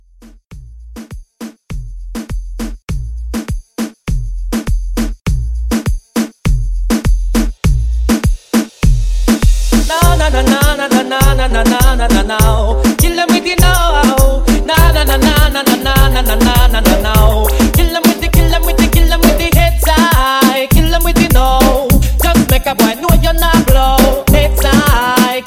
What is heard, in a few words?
Moombah Re Drum 100